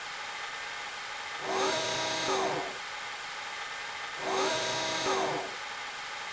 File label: idle, weapons; cso